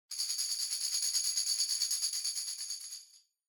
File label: Tambourine Roll